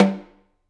K2 room Snr Off

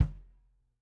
Kick, drum

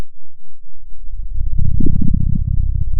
Sound of a dinosaur

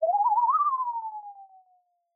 Sound of an owl

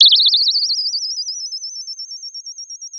Sound of a bird tweeting nicely

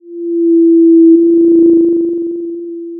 pop, Sound of a cruise ship horn

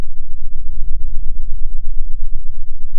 pop, Sound of a train

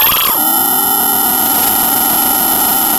pop, Sound of a cat meowing

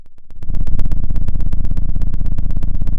pop, Sound of a cat purring

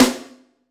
Snr, drum

